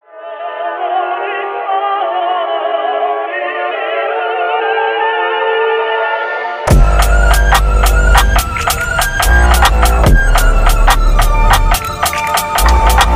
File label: instrumental tik tok remix